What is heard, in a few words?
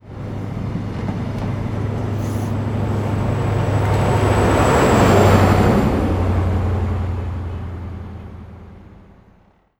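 hst mtu, train; konakaboom; simutrans; Pak128.Britain-Ex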